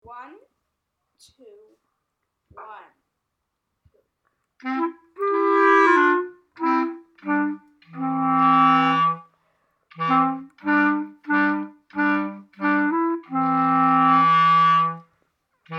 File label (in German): Klarinetten Duo; Mozart